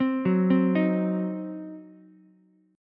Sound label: C Diminished C, Ukulele; arpeggiated; JGuitar